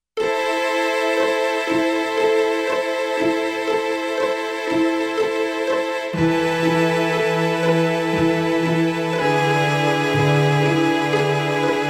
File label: After Rain Strings